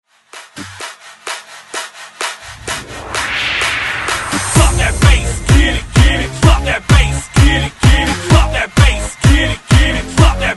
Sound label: Remix Hype 128 Bpm